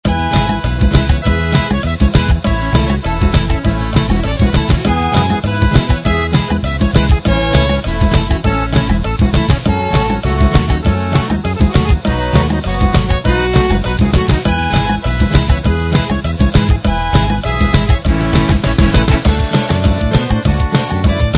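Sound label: Zydeco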